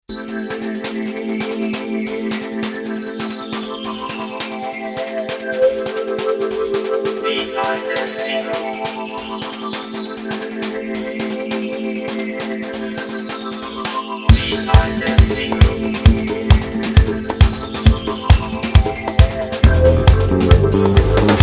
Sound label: disco